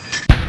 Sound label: weapon